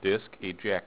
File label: eject